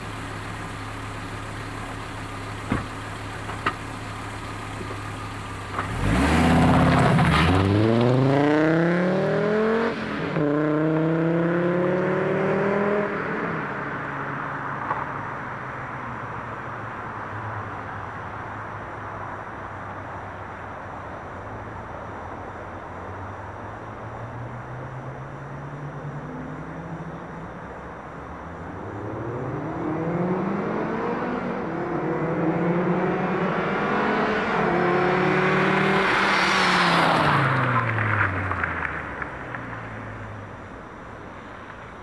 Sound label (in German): 20V Sound Fahrt aussen